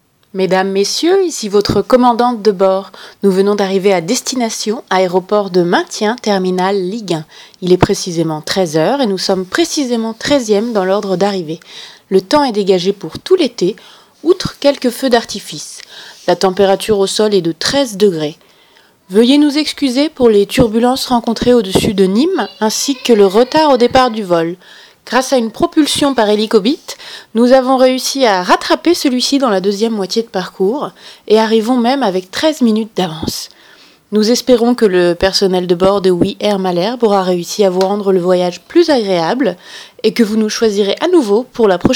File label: Vocal